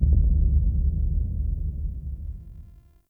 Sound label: Under City Alley Low Rumble